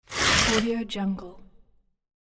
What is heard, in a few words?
tear paper